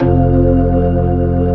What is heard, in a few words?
P-ORG F1 L, POWER ORGAN